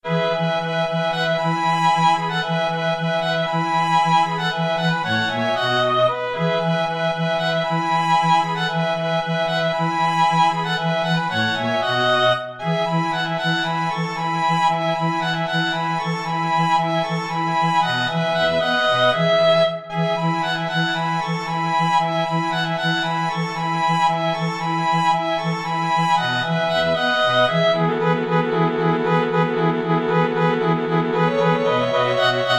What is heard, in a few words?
string quartet